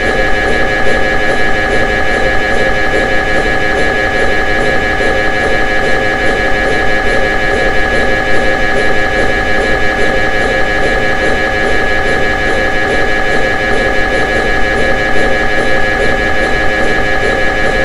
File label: Ambient Cryogenic PDT2